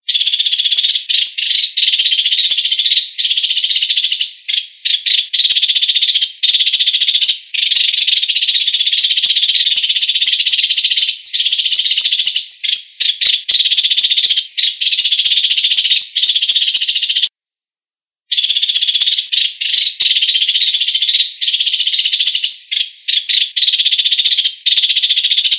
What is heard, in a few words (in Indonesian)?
suara burung gereja